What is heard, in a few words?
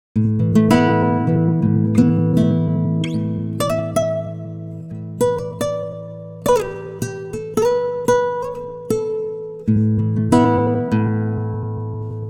guitar, time stretch; usb uvi workstation